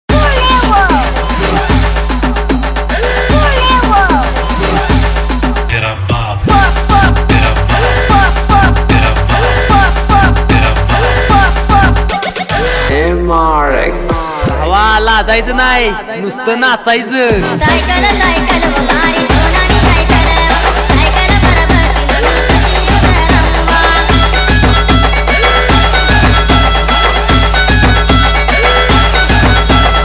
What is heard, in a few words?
Marathi Remix